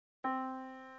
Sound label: yamaha Disk